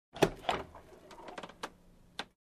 Storing Luggage on Overhead Bin

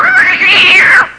Cat meowing in pain

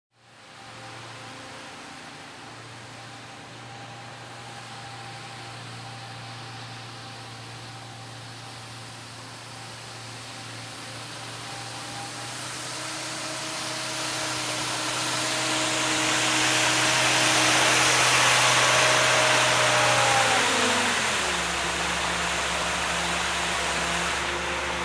Street cleaning vehicle approaching

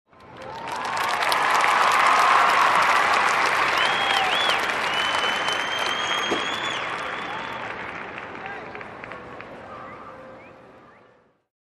Amazed Sports Game crowd cheers

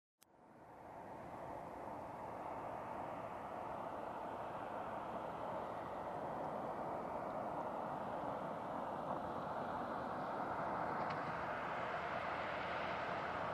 Car parking and engine shut off

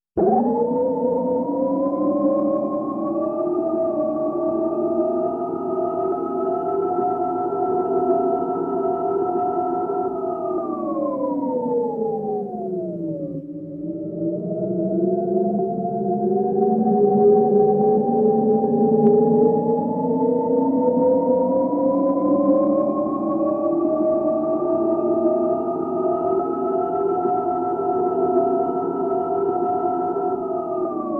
UFO sound like ambience

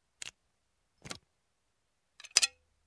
Lock Click

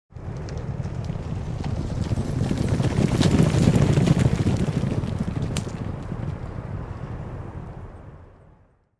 Horse Carriage passing by

